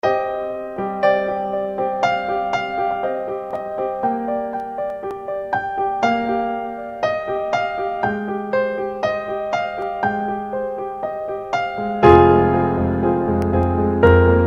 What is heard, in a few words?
Theme Song